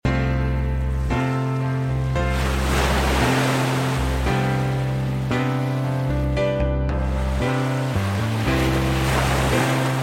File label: slow piano ocean